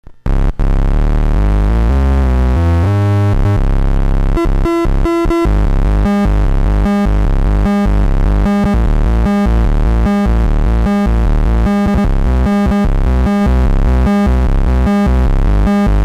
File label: atari jam; Synthcart